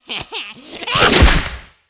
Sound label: poison plant attack, monster